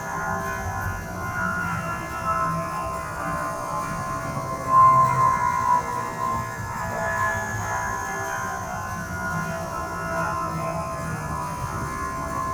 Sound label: Endless Falling, Shepard Ambiences; The Sound Pack Tree; Intergalactic Tension